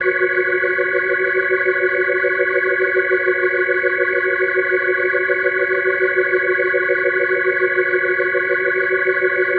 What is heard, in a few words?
Organ F# Sus, Hip-Hop, Organ Licks